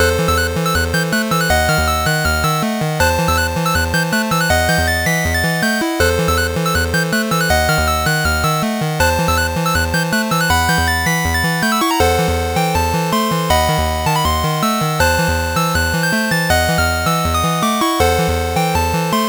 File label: Boss Music; Bebop and Tempo